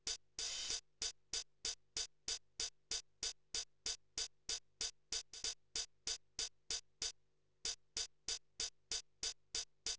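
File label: with drums, instantaneous; SiSEC11; underdetermined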